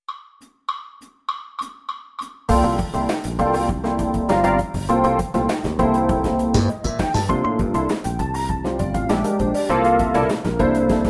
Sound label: AuxPerc Playalong FUNK, Jazz; Aux Perc Etude A-2 Funk Track